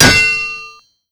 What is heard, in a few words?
impact; saxxy